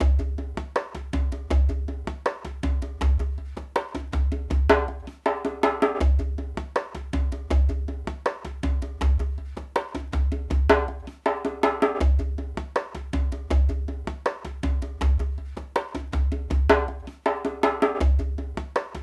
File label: Djembe; raji an ancient epic